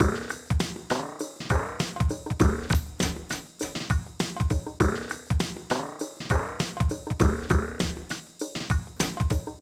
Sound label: Loading Loop; burger shop 2; mobile; windows